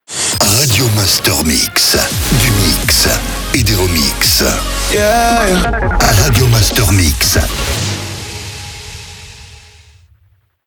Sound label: Jingle SLOW; Radio Master MIX; Habillage